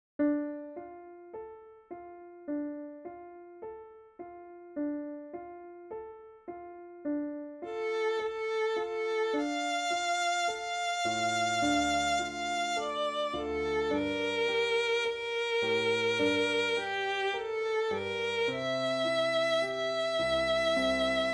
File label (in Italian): serenata per violino e piano